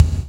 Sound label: BIG KICK, ACOUST KICKS; Greytsounds